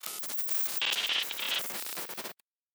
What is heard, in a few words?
Glitch